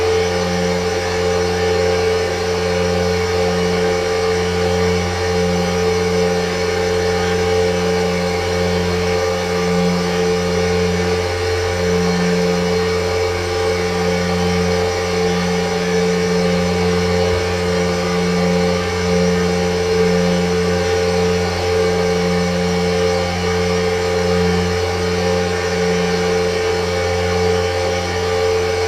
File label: Dome Panel Hum; Boomtown; Titanfall 2